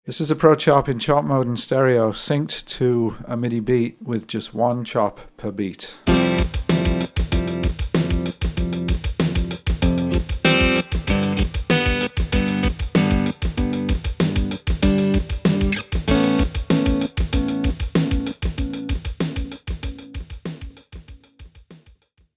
Chopper beat; GFXPROCHOP

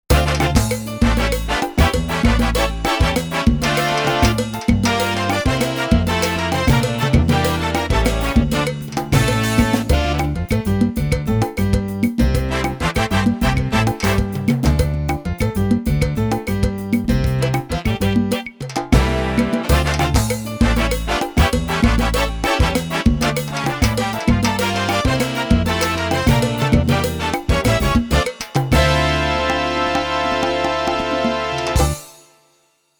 salsa

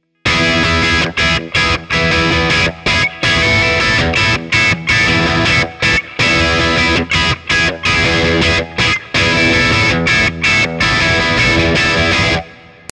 Brit hi-gain

